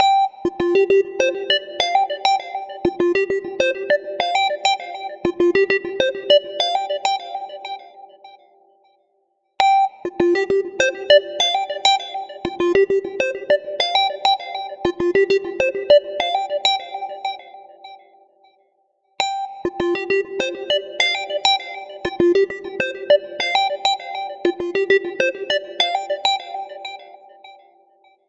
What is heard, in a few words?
Chic Business, ringtones